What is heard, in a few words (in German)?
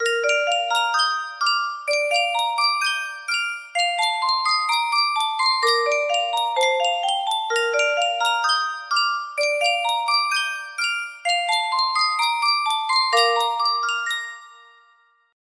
Yunsheng Spieluhr, music box melody